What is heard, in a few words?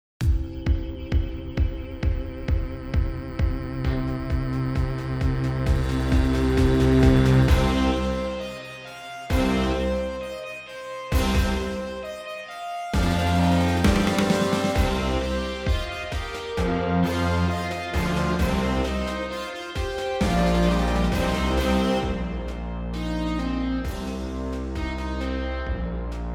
Cyberspace 132BPM